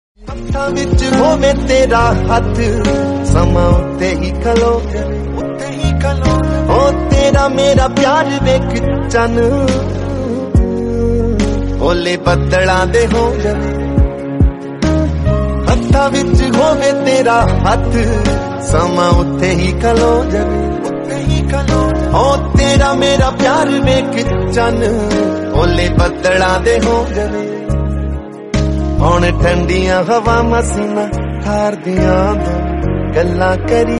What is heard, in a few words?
Lofi Punjabi, Song Ringtone